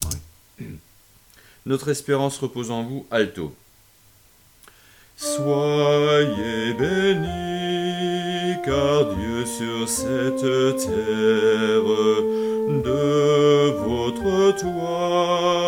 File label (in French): Notre esperance alto